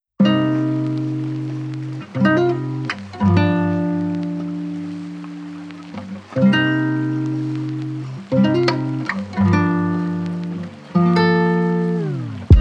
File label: lofi hip hop